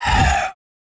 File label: Bone Tyranno HIT, game; Eft